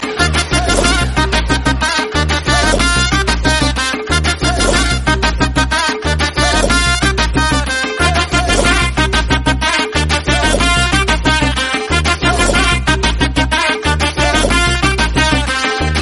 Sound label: pungi trumpet msg